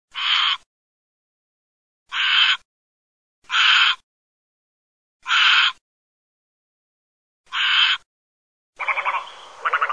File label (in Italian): garzetta